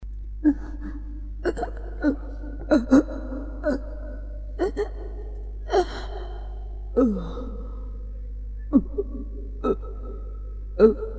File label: moan